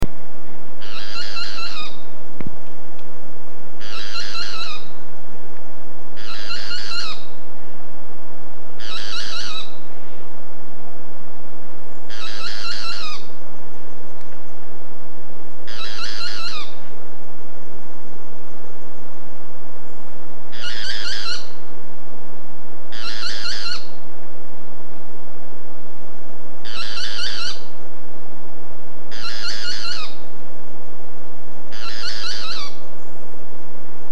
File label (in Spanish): pitangua